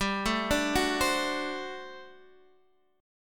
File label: G Major 7th Suspended 2nd Suspended 4th G down Guitar Half Step Down; GM7sus2sus4